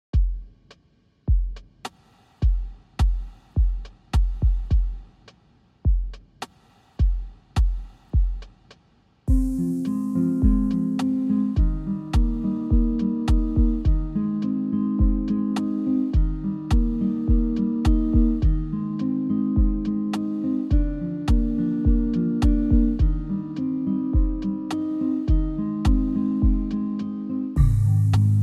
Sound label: Ensemble, Eb major 105BPM; aiva-sound-showcase